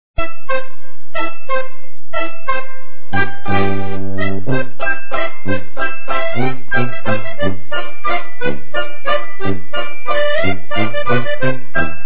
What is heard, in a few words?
cuckoo waltz